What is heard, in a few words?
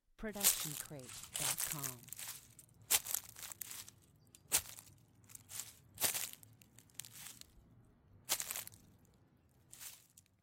stepping on leaves; soundscrate